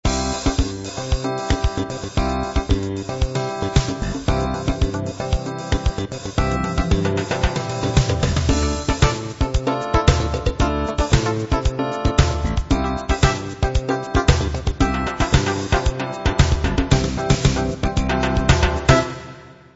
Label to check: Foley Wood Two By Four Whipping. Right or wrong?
Wrong